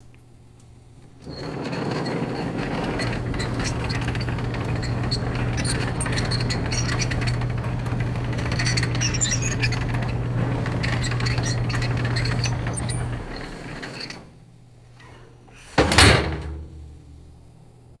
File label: heavy metal refrigerator rolling on wheels